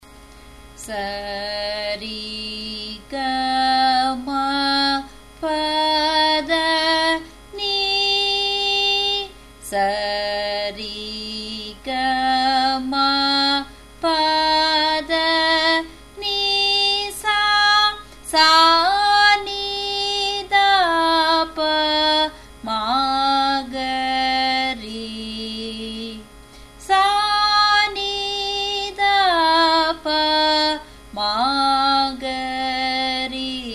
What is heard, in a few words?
Sarali Varise G sharp, music; Carnatic Music